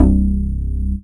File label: DM BAS, Dance Mega Synth